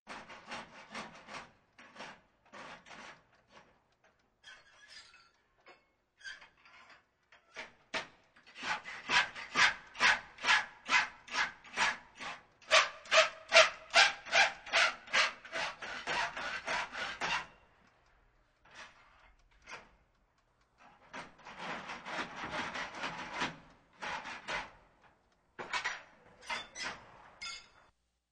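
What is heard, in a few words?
filing and putting down the tool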